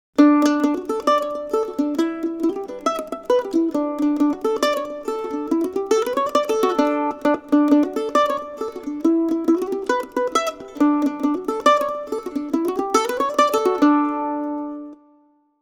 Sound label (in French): mandoline